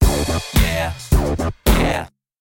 Goblin Robot Dance

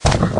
bowler step

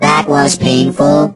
hurt

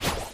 dry fire

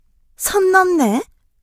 hurt; melodie

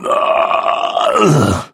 die

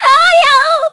die

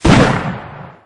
musket fire

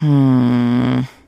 trader sigh; 商人杰恩（七日杀）

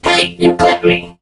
mech crow dies

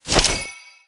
step

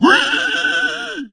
Scream

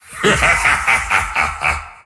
laugh; Aatrox

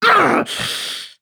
hit; Bruxa

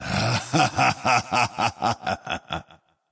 laugh; 潘森（英雄联盟）; 不屈之枪

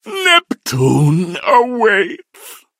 die vo; clancy

